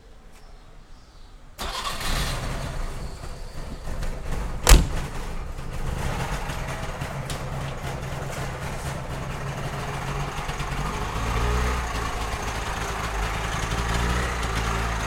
Trabant 601

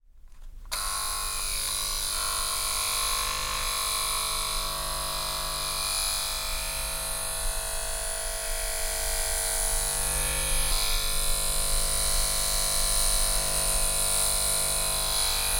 Rasierapparat Bebo sher Favorit

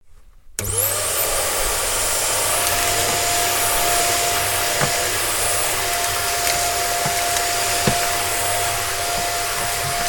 Handstaubsauger Omega Comfort

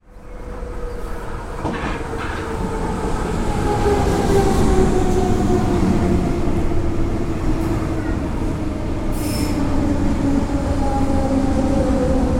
S-Bahn

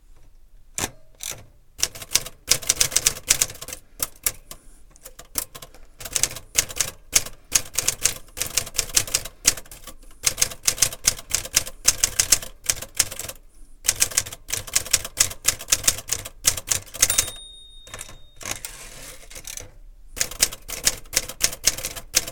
Schreibmaschine Erika Mod 32

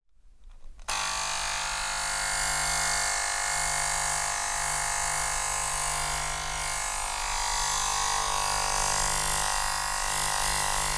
Rasierapparat; Bebo Sher 2001

